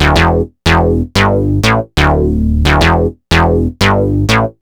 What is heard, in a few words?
Boogie Bass, Synth Bass Loops; Basses; Sonic Foundry (Sony Creative Software); Groove Elements